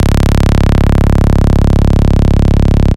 Bass Cuts; Instruments; VES1 Bass Cuts

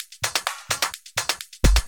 Electro Loop 128 BPM; Vengeance Samples

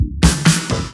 Fill Ins 128BPM; Vengeance Samples; VEH2 Loops

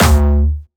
D2, Jumpstyle Kick; Bassdrums; Jumpstyle Multis; vengeance essential club sounds-3; VEC3 Bassdrums

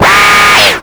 Synths C; Vengeance Samples

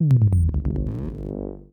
Outlaw Kit 138BPM Sub Fx; Sound FX; Gminor

